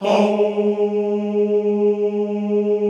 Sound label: "houuu" G#2, classical choir; Best Service; Extended Classical Choir